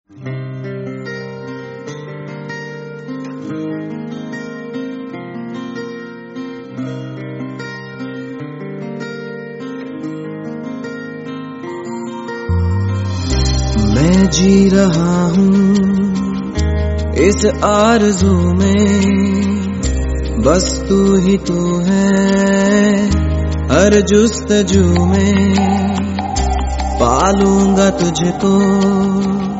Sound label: Unplugged; jashnn 2009